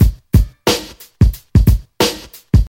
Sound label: 119 bpm 00s rap breakbeat g sharp key, Free drum loop - kick tuned to the G# note